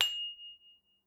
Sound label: ding soft, dings